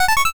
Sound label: combo